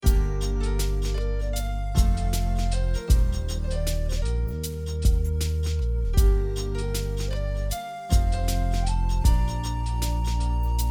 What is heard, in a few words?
music